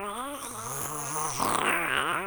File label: critter idle growling loop; nightmare criters